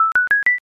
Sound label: score up